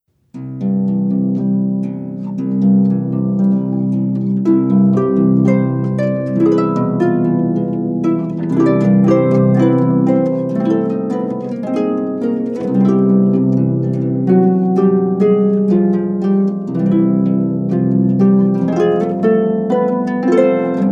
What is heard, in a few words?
Theme from Second Piano Concerto, harp; Rachmaninoff